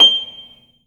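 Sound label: pluck G7, piano; AKAI S-Series CD-ROM Sound Library VOL-2; PF MIX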